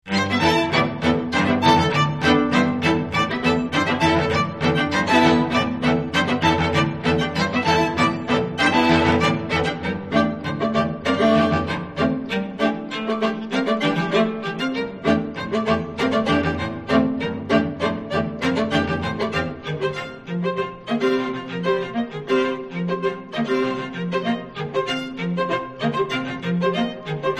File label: rhythm fire